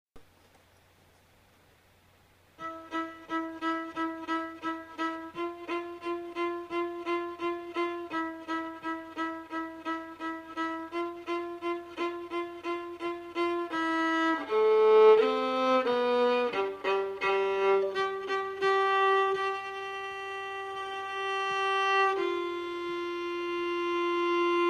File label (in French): accompagnement pieternelle simple